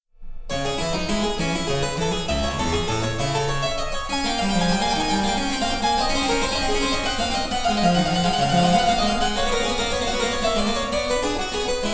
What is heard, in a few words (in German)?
Cembalo; Mark Lindley WTC 1